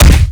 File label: bail body punch; skater; Tony Hawk's Project 8; XBOX